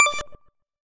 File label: power connect; Smartisan OS 6.0.0